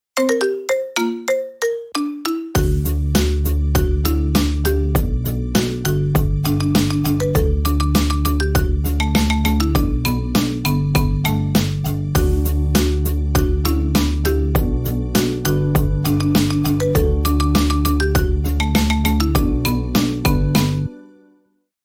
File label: Marimba Remix